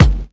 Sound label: 00s hip hop bass drum sound g key, Royality free kickdrum tuned to the G note.